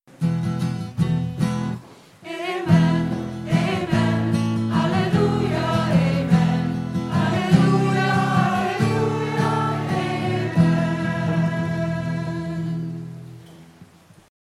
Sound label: Amen